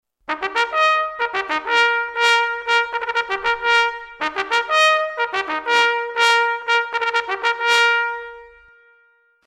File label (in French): Sonnerie Appel Des Consignes